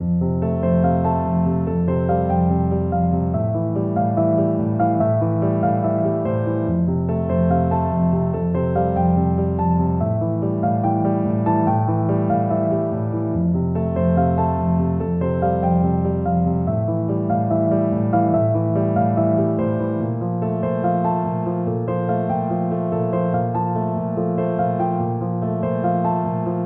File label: Cinematic Piano